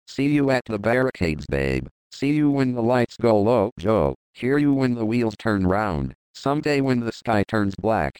Jazz

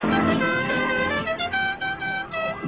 general horn; dukes

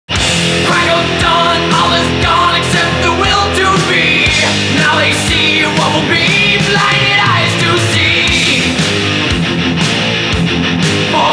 bells

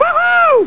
woohoo

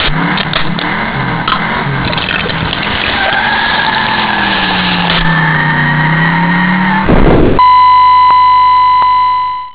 crash; Princess Di; Drunk Driving Deaths

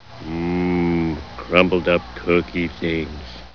mmmm crumbled up

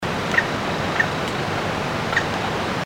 Chinese Bamboo Partridge; Bambusicola thoracica; call; Bird Call Recordings

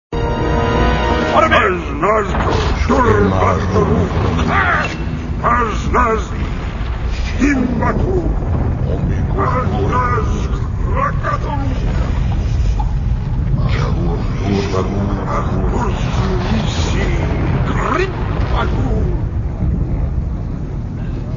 ring

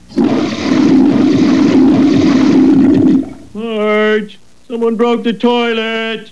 toilet

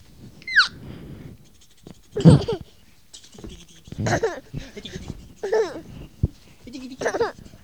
tickle

